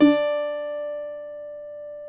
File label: CUATRO D 4, Guitars; Spectrasonics; Hans Zimmer Guitars VOL-2